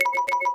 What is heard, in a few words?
vibration